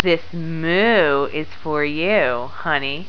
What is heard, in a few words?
moo